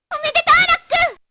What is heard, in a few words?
roll2; Japanese; omedetou, Rock